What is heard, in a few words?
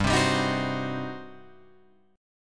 G Major 7th Suspended 4th Sharp 5th, G, down, Guitar, Open F; GM7sus4#5 strummed